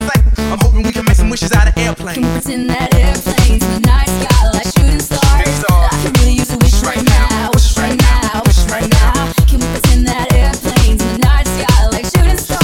Electro Plane

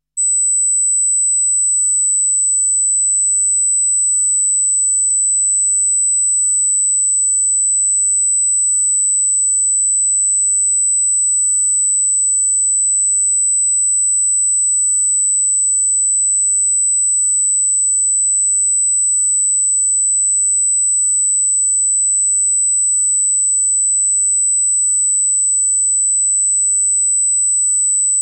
Ahhhhh

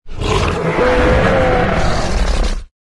Carnotaurus

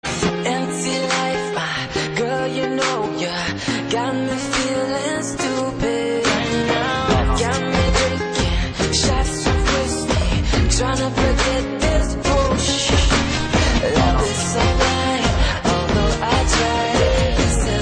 English Rnb

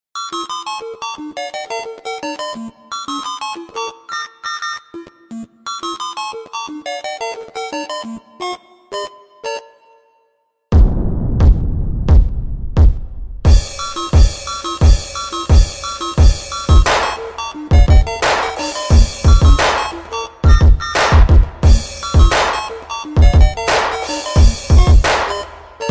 Techno; Mario

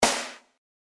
Snare Drums

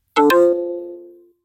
Login

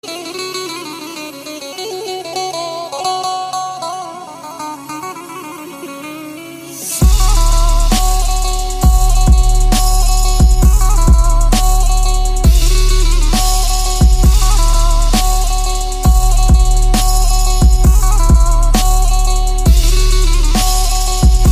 Turkish Saz Remix

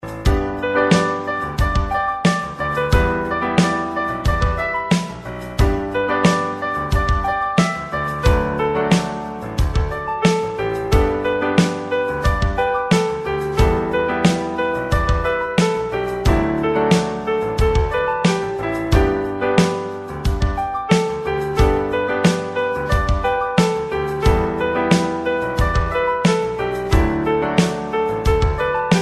Piano Mix

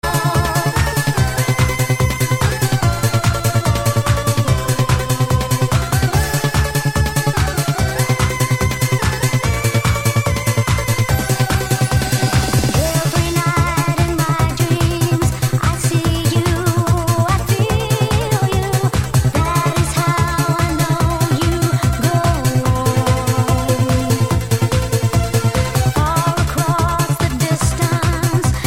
Techno Remix

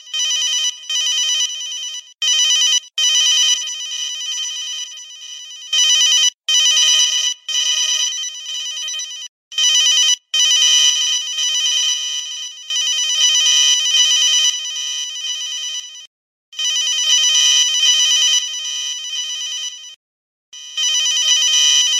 Cell Phone

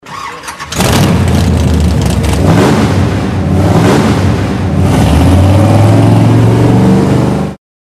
Car Reving

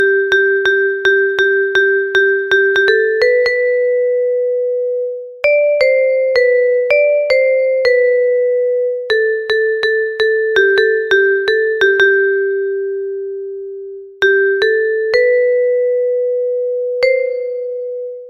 Vibraphone

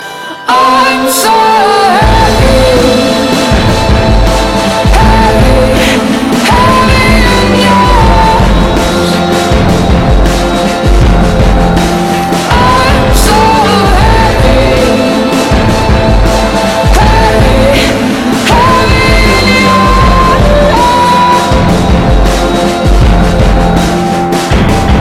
Heavy

